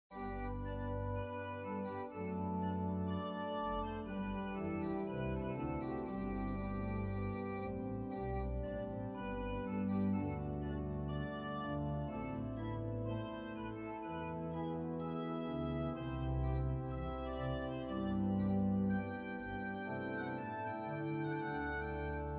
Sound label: Zwolle front omni rear